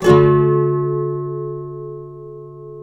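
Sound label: nylon guitar up major D#, flam chords; Spectrasonics; Hans Zimmer Guitars VOL-2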